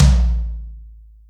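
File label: Ambo tom, Boss DR660, ht; drum machine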